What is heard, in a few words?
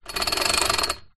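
household whipper snipper starter cord release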